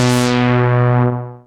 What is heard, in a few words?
Analog; instrumente